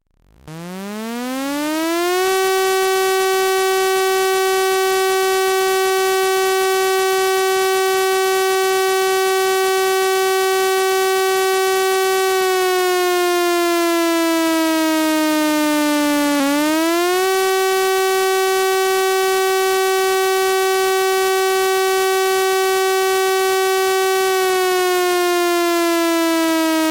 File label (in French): sirene essai mensuel